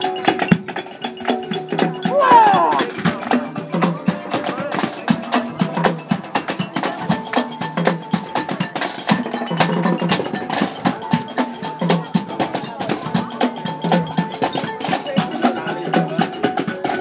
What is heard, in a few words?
marching jazz band; billingham nr stockton on tees